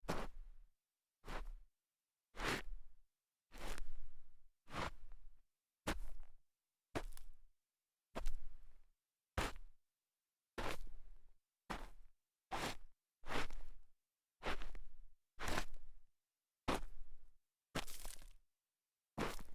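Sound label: sneaker scuff dirt, foley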